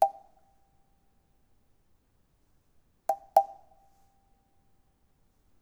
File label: Mokugyo; Instrumentensammlung